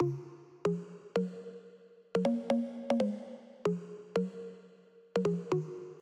music main game melody; reef raider

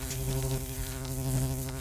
freespin Triggered Loop; godsoffortune

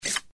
Card

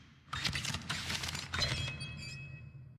picker Win; fairymirror

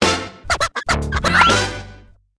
button continue; Free Spins Intro; scruffy duck

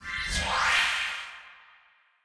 fairy Wand; fairymirror

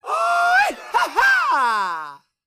large Win Grito; colossal pinatas

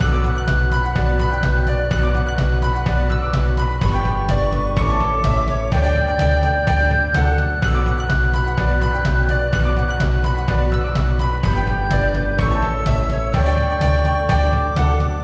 big win loop; east sea dragon king

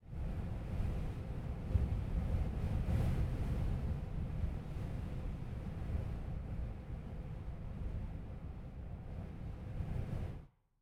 wind; the wolfs night

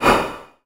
short breath; dark king

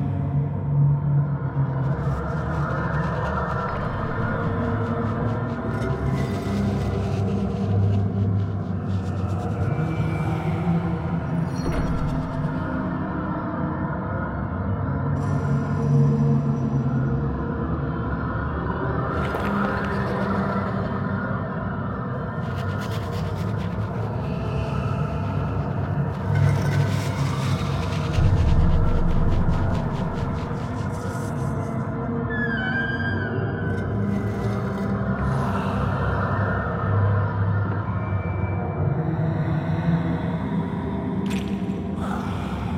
Ambience

